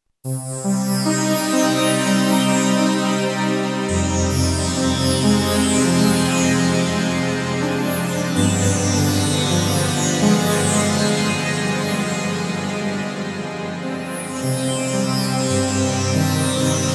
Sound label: Sampler